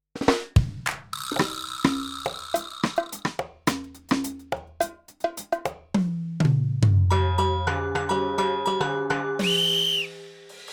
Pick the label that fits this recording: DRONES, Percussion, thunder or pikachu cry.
Percussion